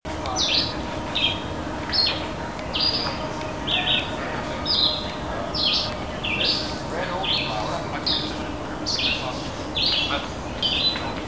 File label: red eyed vireo; Birds; Vireos